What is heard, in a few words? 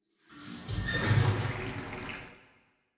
Bubbling Cauldron Spell; SoundsCrate